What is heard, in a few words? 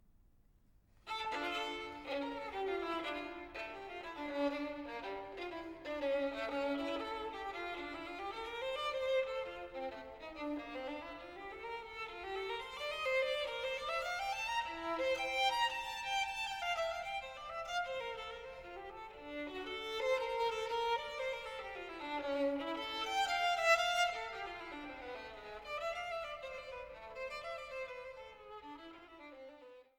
partita no. 2 in d, courante; bwv 1004